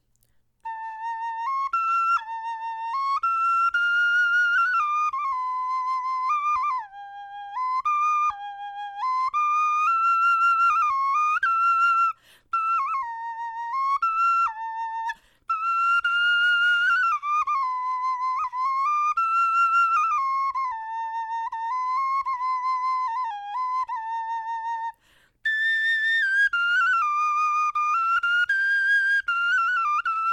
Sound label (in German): melodie